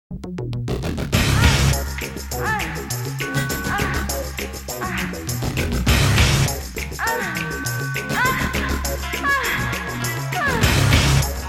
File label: girl sex noises